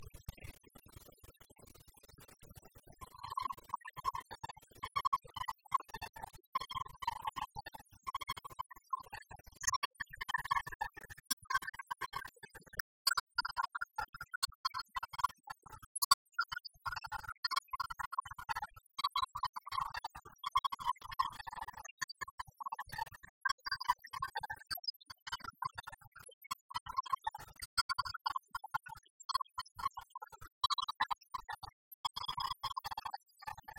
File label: Carol of the Bells Bell and Recorder choir